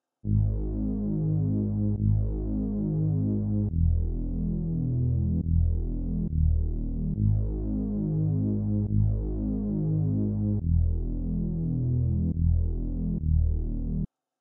139 BPM G# Min Bass; Melody; Loop Stems; Drill; Cymatics; 2022 Melody Collection - Drill; No Involvement

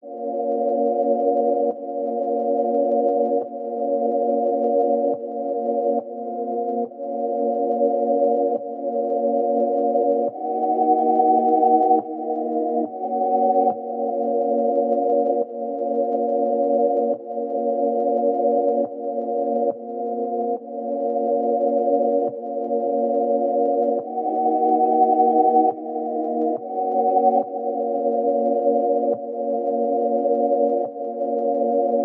140 BPM A# Min Synth, Melody Loops; Synth Collection; Cymatics; ANALOG EVOLUTION Beta

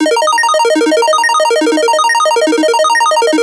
Arp D# 140, Melodic Loops; FastArp; Ghosthack - Color Bass Essentials

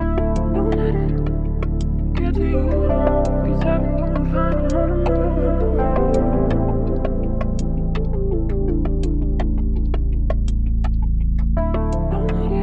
166 BPM A Min, RnB Melody Loops; RnB Collection; Gave It All

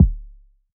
Kick, Lo-fi; Hip-Hop; One Shots; Ghosthack; Drum Mega Bundle Demo; Hip-Hop & Lofi Drums

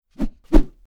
Low Whoosh; Fairlight Sound Lib

